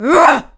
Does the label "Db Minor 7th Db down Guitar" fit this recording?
No